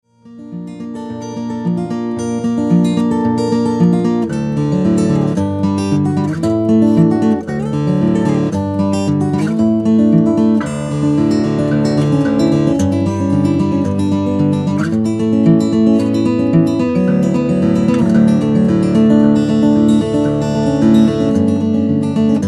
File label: Guitarioso